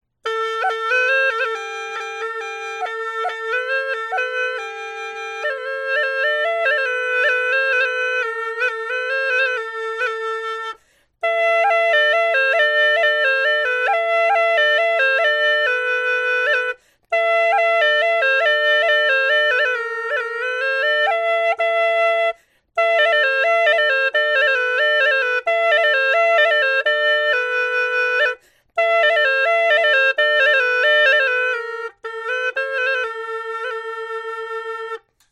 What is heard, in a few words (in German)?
hulusi einfach hoch